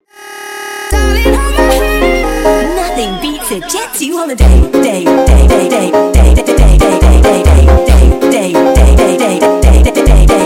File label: Funk sped Up